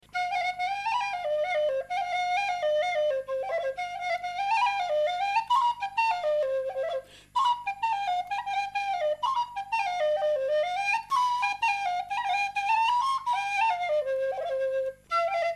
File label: jigs, Irish